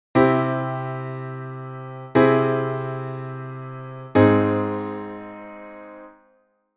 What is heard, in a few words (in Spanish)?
Modulación Do M – Do m con 5aum, guitar